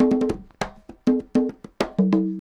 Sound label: conga 100 bpm, latin; Sound Effect Library